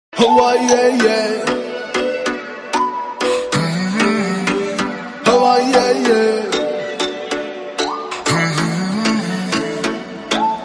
Super Cute BGM Ringtone

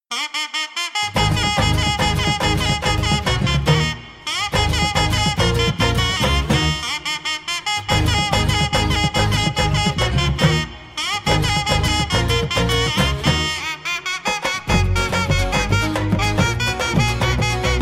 Love Bgm Ringtone

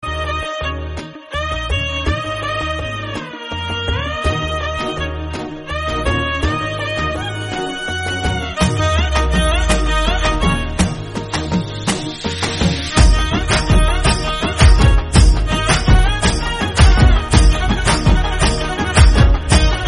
Bgm

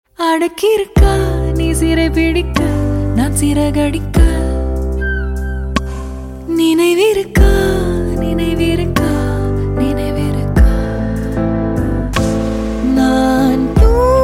Female Ringtone

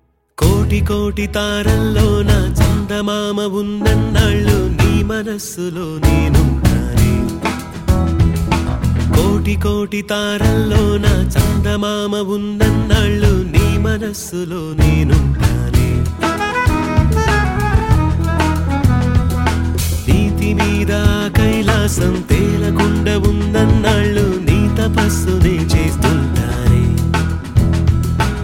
Male Ringtone

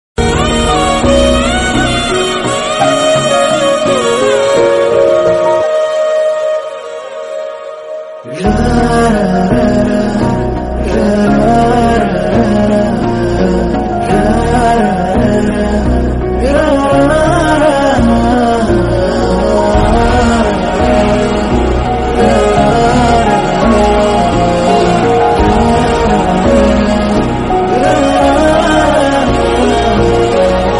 Love Bgm Ringtone; Avastha

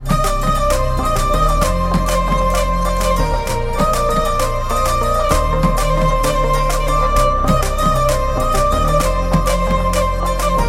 Solo Violin BGM; Nesippaya; tamil

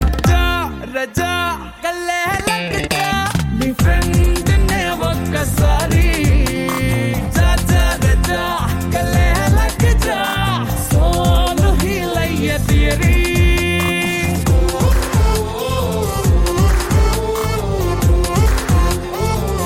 BGM Ringtone; Rowdy Boys

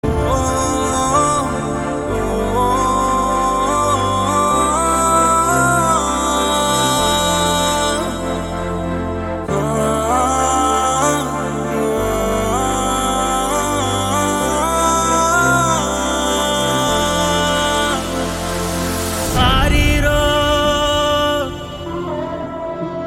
Humming

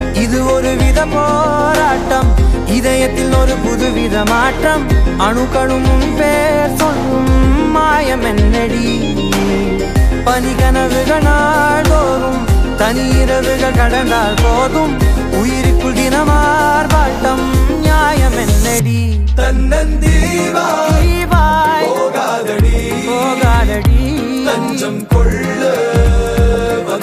BGM Ringtone

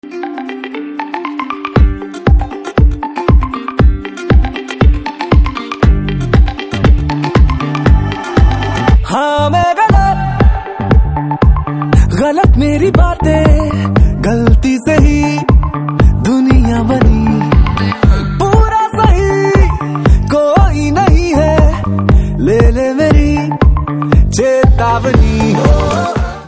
Ringtone

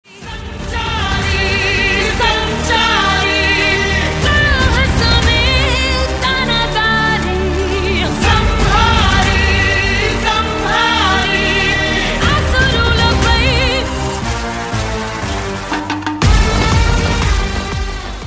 Telugu Song

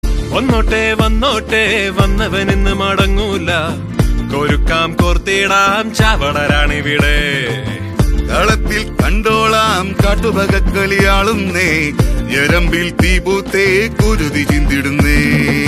Ringtone BGM

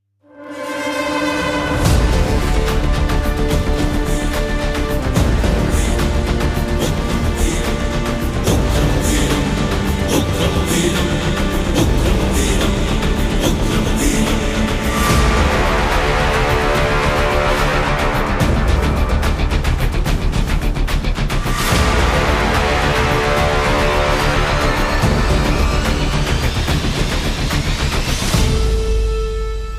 Main Bgm Background Music Ringtone; Ugram

